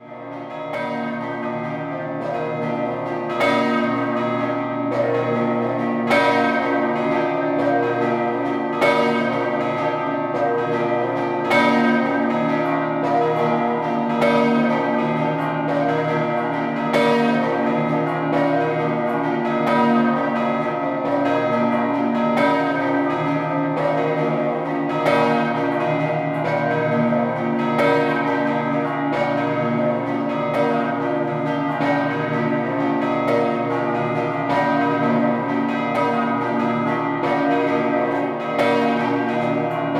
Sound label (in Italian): Pordenone Duomo, campane